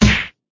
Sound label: Punch